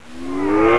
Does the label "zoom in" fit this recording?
Yes